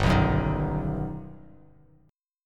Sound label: G Augmented Major 7th, Bass Standard; G+M7 strummed; JGuitar